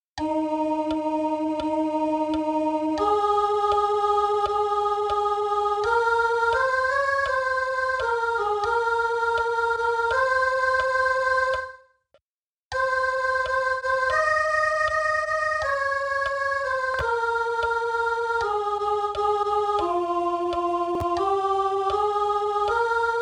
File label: Sanctus SOP